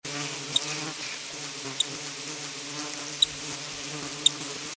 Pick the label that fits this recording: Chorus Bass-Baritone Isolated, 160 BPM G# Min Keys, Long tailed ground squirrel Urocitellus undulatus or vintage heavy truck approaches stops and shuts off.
Long tailed ground squirrel Urocitellus undulatus